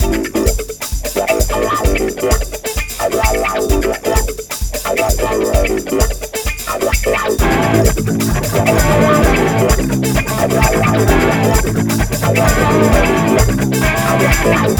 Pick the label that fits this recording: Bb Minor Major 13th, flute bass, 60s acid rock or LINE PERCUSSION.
60s acid rock